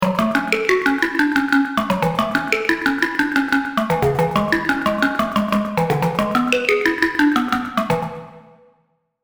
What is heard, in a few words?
vital presets; chiqui audio; vital plucks presets